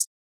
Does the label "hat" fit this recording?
Yes